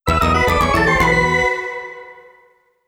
Life Lost [Roland GS]

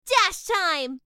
Dash

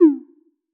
Fall Large

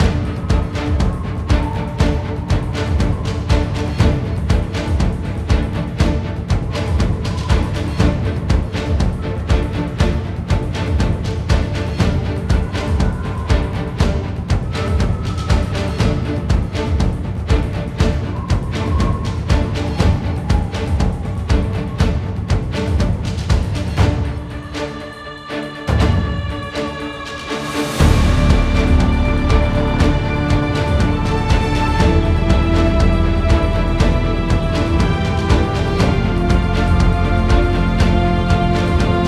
Fight Standard 2

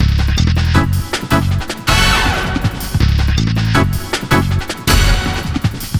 Hiscore; Arctic Thunder

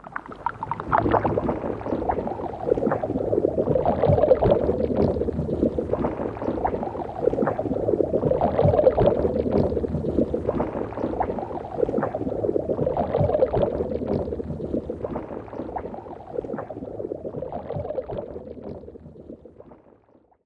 Acidwear Loop; Se Sp Acidwear Loop

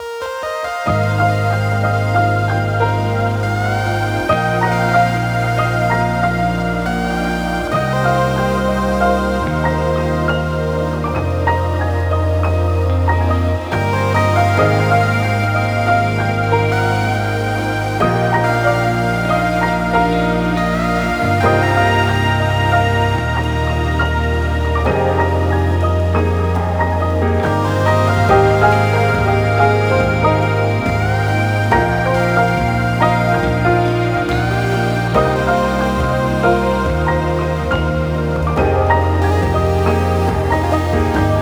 Song Timestream

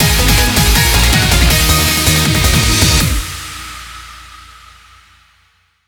Bgm Reach; Mahjong Fight Girl (Arcade) (gamerip) (2023)

